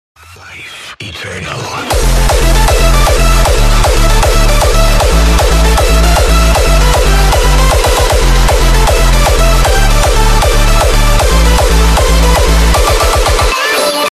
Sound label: hardstyle